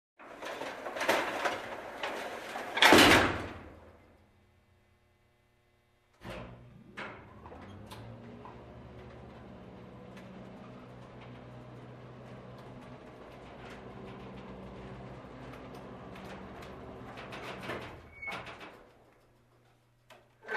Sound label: Goods on a roller transporter sequence